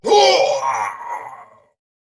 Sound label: Death; Warframe; Dax Ashigaru; Battle Barks